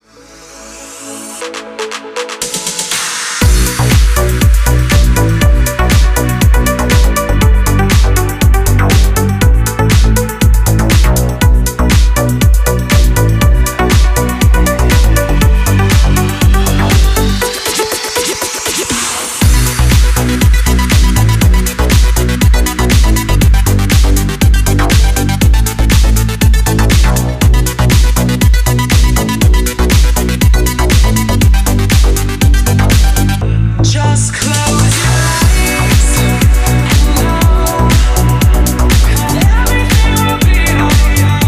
ritmichnaja krasivaja narezka

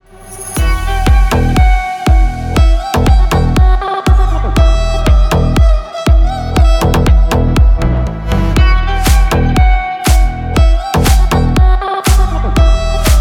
vostochnye motivy na zvonok

